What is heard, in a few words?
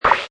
Cartoon Pie, Cartoon impact sound -- pie in face or other hit; Comedy/Cartoon PIE; Funny Sound Sound Effects; Lynne Music